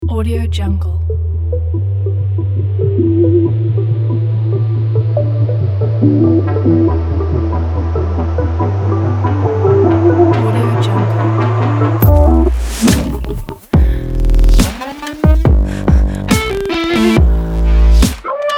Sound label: future bass aggressive